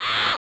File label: "Ahhh!"; Beavis and Butthead; SNES